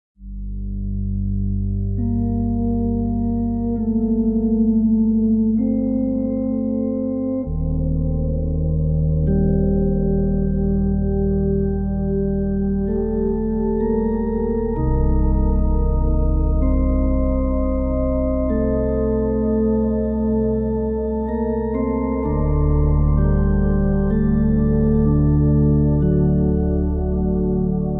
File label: Organ; cathedral; Signal AR